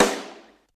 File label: 00s reverb acoustic snare sound f key, snare single hit tuned to the F note